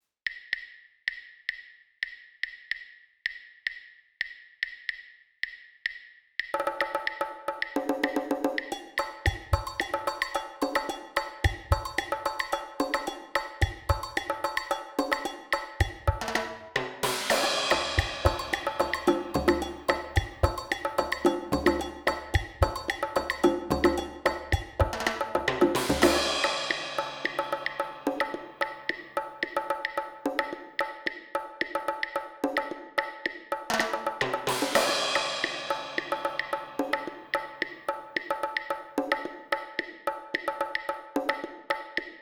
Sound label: changui 2 a 220 bpm; afro cubain